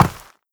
step run, footsteps, player, dirt

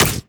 bite, headcrab; reviver; creatures

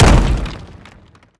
bash medium, brickwall, destruction

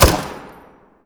mg mech, weapons; combine; Half Life Alyx

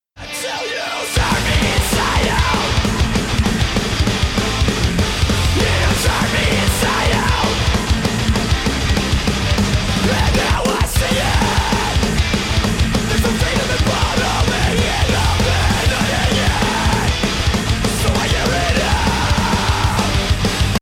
start round, music

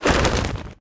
wings hop, pigeon; critters

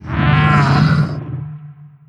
dry, strider idle; creatures; combat; vox

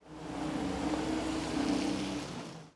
dust car driveby; ambient atmosphere; csgo; Counter Strike Model Pack

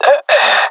death, swat; vo; agents; Source Model Packs; Counter Strike Model Pack; CS2; swat epic

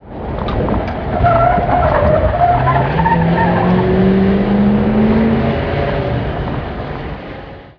truck pass distant; Half Life Model Pack; Half Life 2

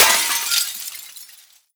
break glass phys medium; physics; breaking

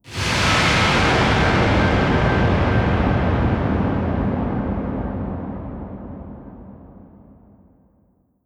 land wet, strider, combat, seq death; creatures

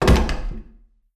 escape rattle, headcrab; creatures; Half Life Alyx; zoo

